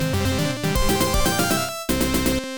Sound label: Victory!; Columns III (Columns III - Taiketsu! Columns World); Sega; Megadrive-Genesis